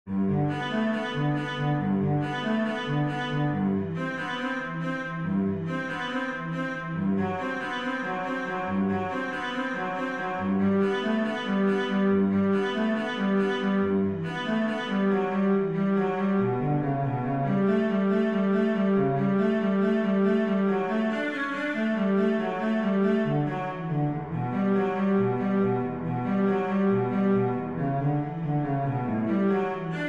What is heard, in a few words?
prelude, cello